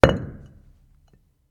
Stone On Stone Impact Loud

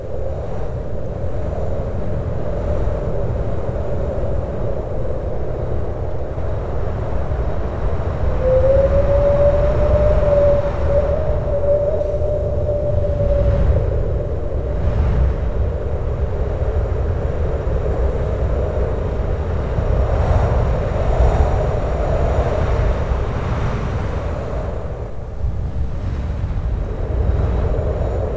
Looping Hollow Open Air Wind

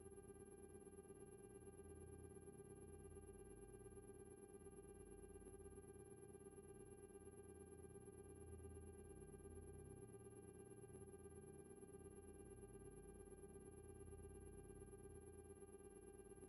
Conveyor Belt

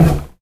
Wood Step

